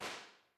Drums, Impulse responses; GML; Henry Olonga; The Beautiful Room

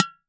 Flower pot, IR mono; Claustrofobia v1.1

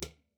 Large Peanut Can

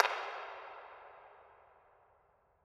PA horn in hall; Factory Hall

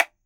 Small speaker, Small intercom speaker; Speakers and telephones

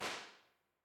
Base IR; Henry Olonga; The Beautiful Room; Impulse responses

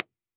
70's Philips box mono, Speakers and telephones

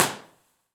Red Bridge, Impulse Response; EchoThief Impulse Response Library

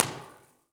Mills Art Museum, Impulse Response; EchoThief Impulse Response Library

